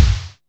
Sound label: KOOSH, KICKS; Back In Time Records - Big Bang